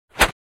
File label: Swish Single; Crashes Impacts